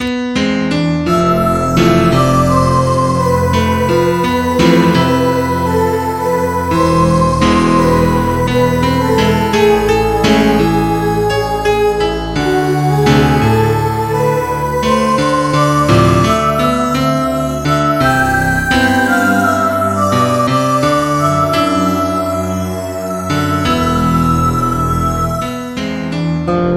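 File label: The Guitars Serenade, MIDI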